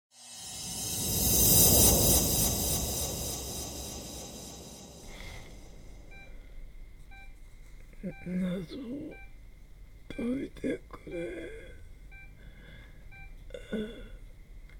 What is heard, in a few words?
asmr